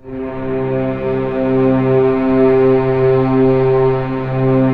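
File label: STR C2 PP, Orchestra Strings; Sound On Sound - Peter Siedleczek's Orchestra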